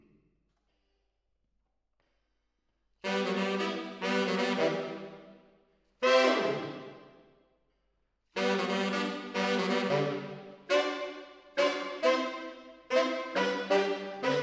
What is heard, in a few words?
MKH AB, piano